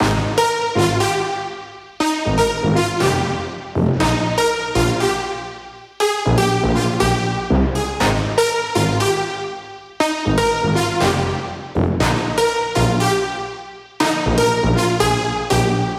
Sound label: Brass Loop 120BPM Ebmaj; 99Sounds x Monosounds; Instrument Loops